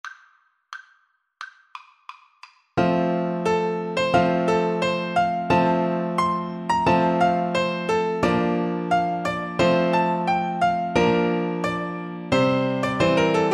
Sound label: scotland the brave, Tuba